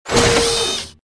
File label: squat